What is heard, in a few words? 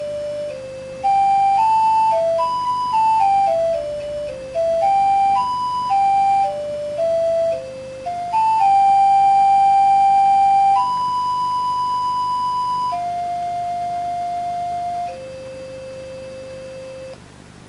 nokia 1200 love ringtone